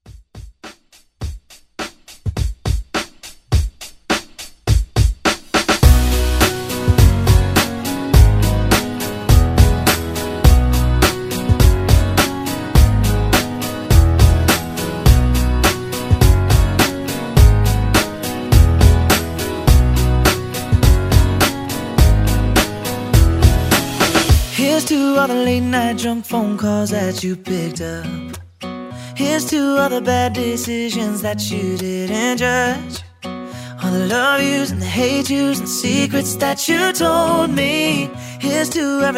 Pop; Redrum

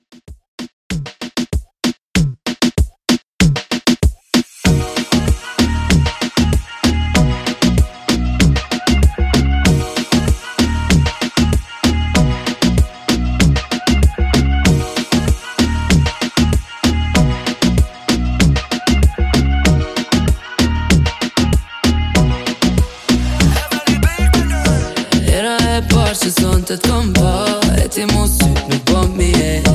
In Outro Moombah 96Bpm

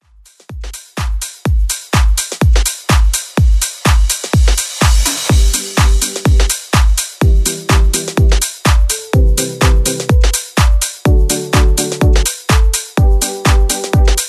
House Redrum

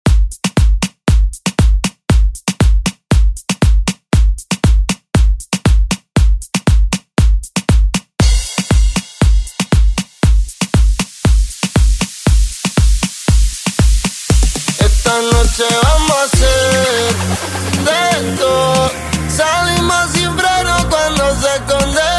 ReDrum

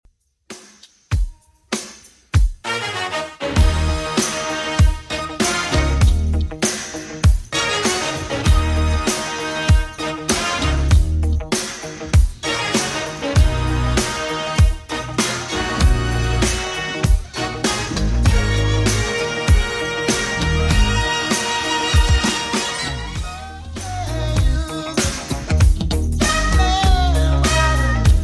80s Redrum 98bpm

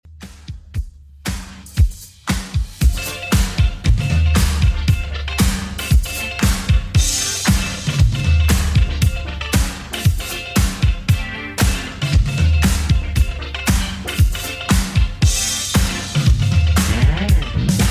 80s Redrum 116bpm

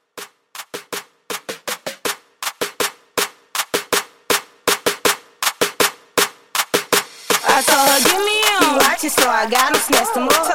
Party Starter

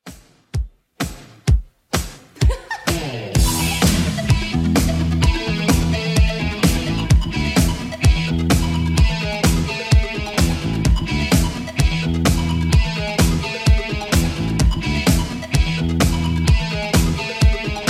80s 128bpm; Redrum

